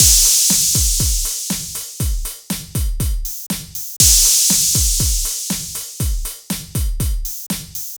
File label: drum, Summed waveform; When these five signals are added together, we have the following waveform; Replicas produced by convolution